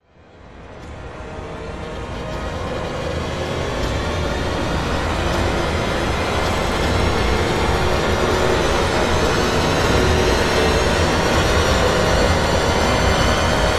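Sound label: music, swell; transformers war for cybertron gamerip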